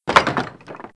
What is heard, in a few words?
Barn Door Normal; Playable Tutorial Level